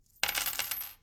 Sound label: coins